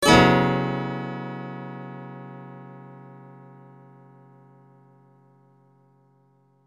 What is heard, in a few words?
guitar; 単音のギター音を読み込ませてやり、バッファの複製、パン・周波数の変更を行ってやるとこんな風にジャラーンとコード弾いたみたいに